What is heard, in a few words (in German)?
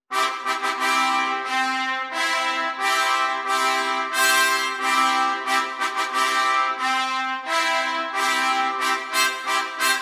heraldische musik